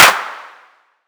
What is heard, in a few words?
Clap; osu